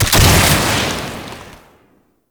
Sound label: Arcane Melee Shockwave Radial Blast; Warframe; Mods; Proc